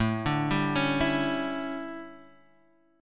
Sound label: D Major 7th Suspended 2nd Suspended 4th A, chord